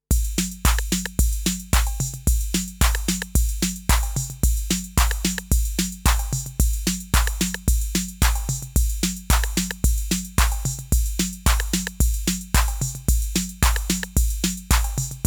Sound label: Loop RD-8